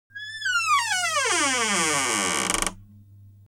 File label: scary wooden door opening